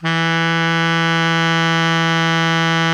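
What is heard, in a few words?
baritone mezzo forte E 2, Sax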